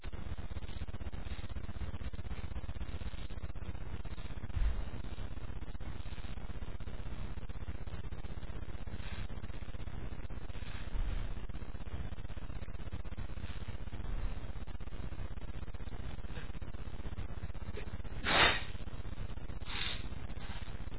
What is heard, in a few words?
pollen, male, achoo